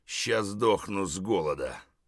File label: tired; Escape from Tarkov